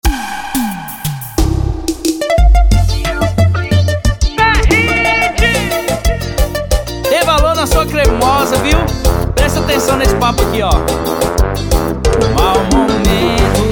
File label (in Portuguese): piseiro